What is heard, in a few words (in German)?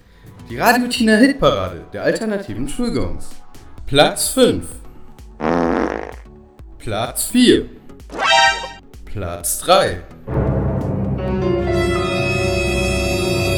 Schulgongs Alternative Gongs